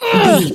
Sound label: voice female attack